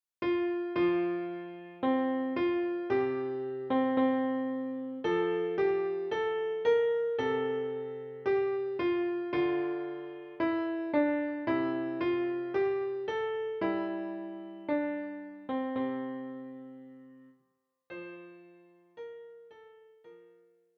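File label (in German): Herbei o ihr Gläubigen Klavier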